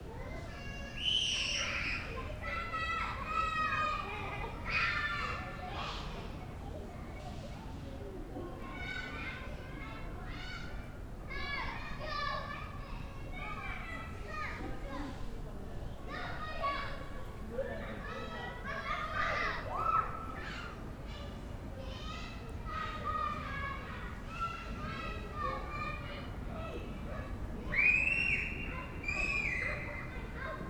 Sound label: Kindergarten Children Playing Shouting Running Around; Justsoundeffects; Urban Ambiences